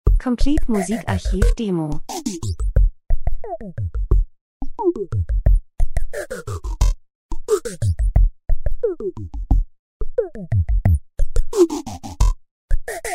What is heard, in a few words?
drums; Elektro Percussion Verfremdet Minimalistisch Kalt Unangenehm Wissenschaft Moleküle Maschine Industrie Dokumentation